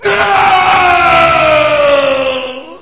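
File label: male voice shouts loudly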